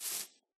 grass, step; Minecraft

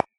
cd tick; Minecraft

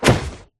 knockback, player attack; Minecraft

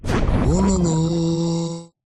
prepare wololo, evocation illager; Minecraft

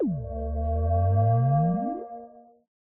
activate, beacon; Minecraft; block

